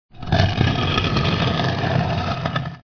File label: stone door; Ancient Modular Tombs